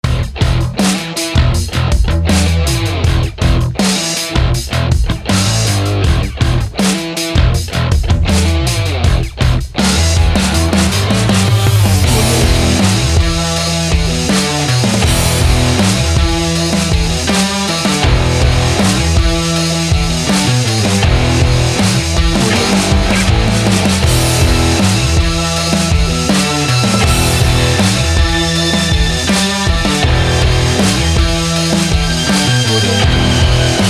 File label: alternative rock trailer